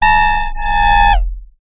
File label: science fiction robotic drill burst; zapsplat